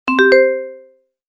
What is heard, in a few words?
alert sound; звуковое оповещение при поступлении новых данных